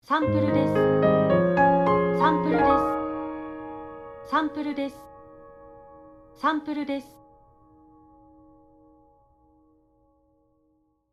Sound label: Logo Piano slow